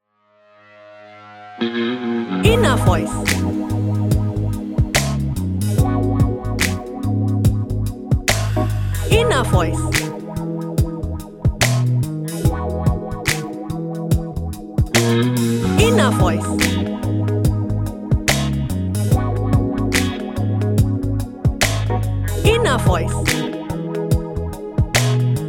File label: saturday night